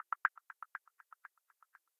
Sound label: typing